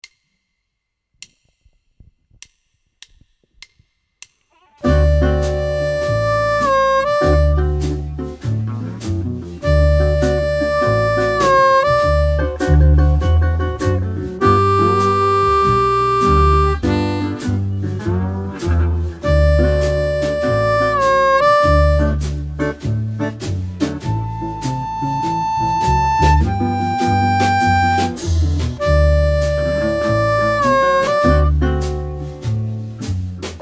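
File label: Blues harp, Быстрый пример, блюз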